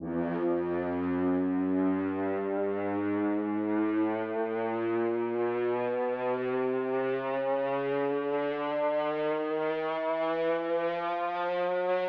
near Win; netent; piratefromtheeast

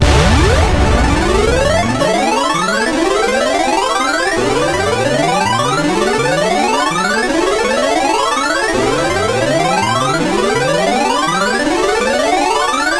big Win Start; netent; reelrush

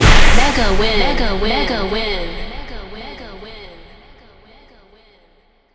mega Win Text Change; netent; doublestacks

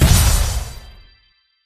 scatter Hit; netent; multipliermayhem

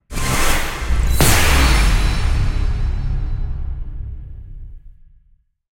outro animation; netent; doublestacks

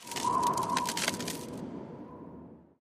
ice Shoot; netent; magicportals

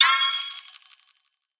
solo multiplier Outro Winamount Attention; netent

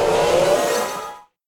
anticipation quick; netent; copycats

